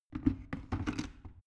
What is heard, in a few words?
plastic water boiler place soft